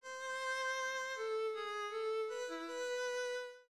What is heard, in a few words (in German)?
Rhythmus Ubungen; Notenlesen lernen